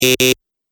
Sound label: Double Press Electronic Buzzer